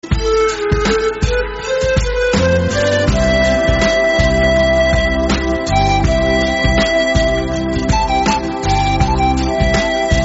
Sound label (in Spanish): musica de fondo